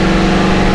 jeep mid, vehicles

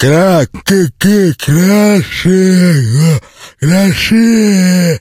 zombie attack

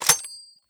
foley shell eject; weapons

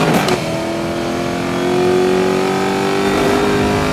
third, vehicles cars; huayra

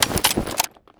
tec9 draw; weapons; dod mp40

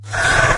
attack, imp; doom3

